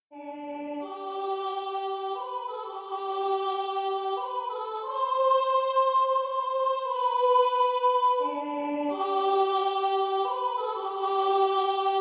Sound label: amazing grace soprano; Traditionnel; Choeur 3 voix SAT